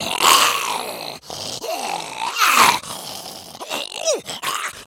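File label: Monster Creature Growl, foley monster vocals; Monster Creature Growl Longer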